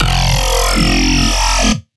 Bass F; Dubstep; Bass One Shots; Ghosthack; Dubstep Sound Arsenal; 50 Bass One Shots

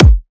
Riddim Kick

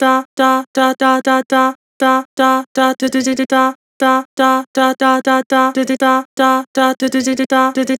Vibrato "Dah", Vocals; Harmor Vocals

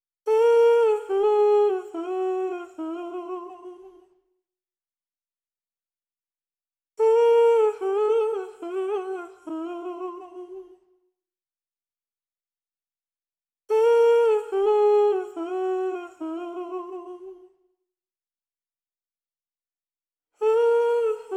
Vocal Loop, 143 BPM D# Min; Come Home; Vocal Loops

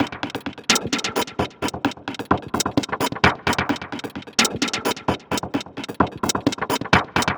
Perc Loop 130, techno; Techno Sounds 2024; Percussion

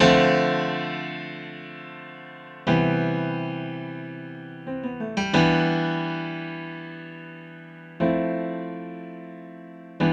Jazzy - Grand Piano - 90 BPM (E Minor); Ghosthack; Free Guitar And Piano Samples

